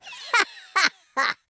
laugh; Patronising

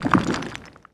debris; Worms3D

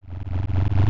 Minigun start; Worms3D

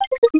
player leave chat; Game Voice; HawkChrome

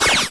SHOT

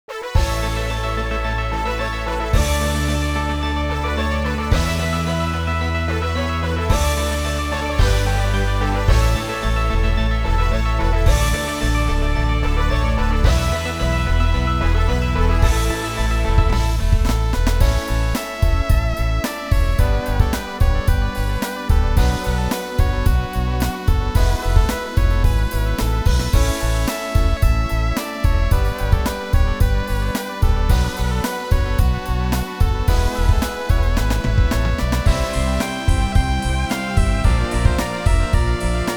intro fanfare; Worms3D

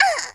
oof, Irish; speech

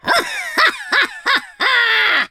laugh, Viking; speech

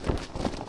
Pigeon Flying; Worms3D

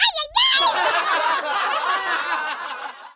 oof

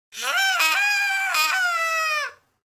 scared; Worms3D; Whoopsie

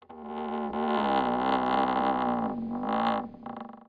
Crk Tree

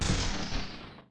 brick

